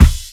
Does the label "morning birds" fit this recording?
No